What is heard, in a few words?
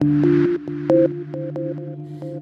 Standard melody, start up melodies; Milestone 312